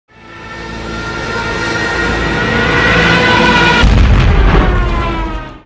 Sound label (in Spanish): explocion final; ze la lata b1